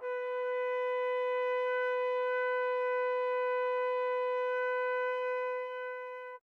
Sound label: flute trumpet; interpolator